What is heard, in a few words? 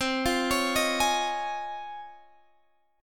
C sharp Major 9th, chord